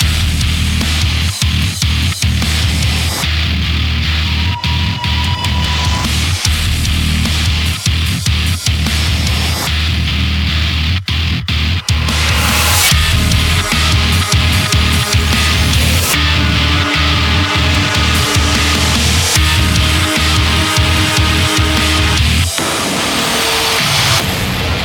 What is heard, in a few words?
heavy; Rust dust & guts heavy (short)